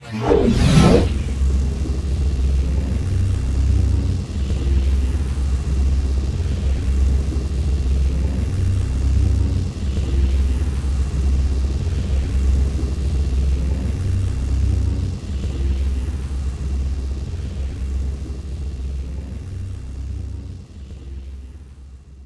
Midfield Barrier Up Loop

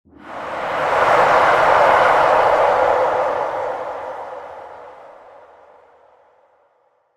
Hype Swell; Sports; Tackleball

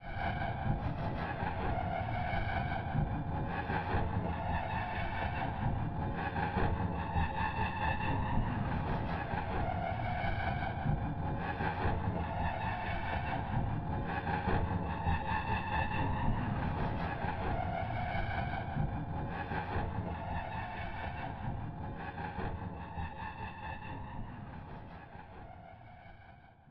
Player Hand Slide Energy Loop

